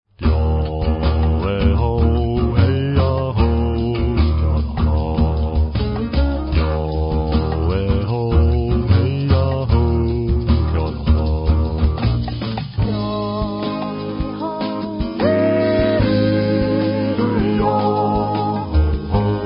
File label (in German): Jodler in As